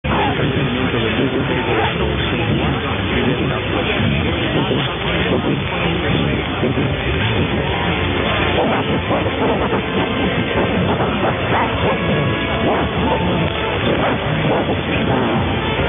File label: Cartagena Colombia ID garbled SP; 820 Radio Vigia